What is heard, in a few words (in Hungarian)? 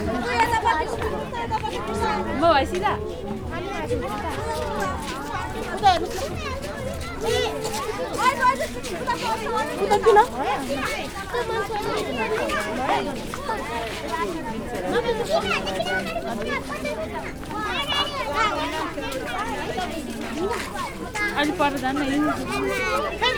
majmok temploma bazár gyerekek felnőttek vegyesen